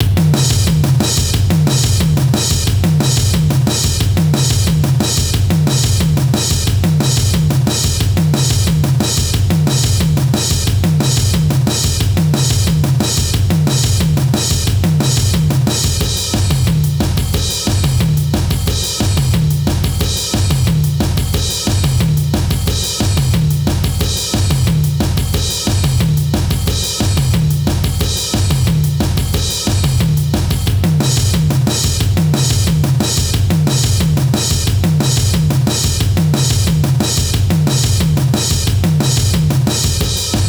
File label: punk drums